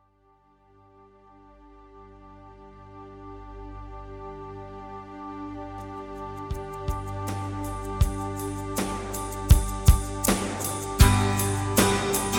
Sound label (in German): Gesang